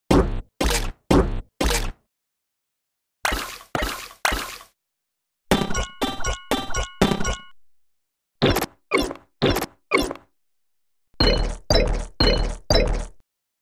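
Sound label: mechanical steps of 5 moving robots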